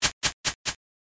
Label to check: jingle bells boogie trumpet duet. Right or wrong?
Wrong